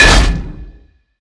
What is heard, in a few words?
recall trident hit; smite; poseidon